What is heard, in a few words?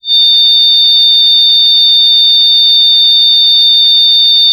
FEEDBACK; ENVIRN-AMB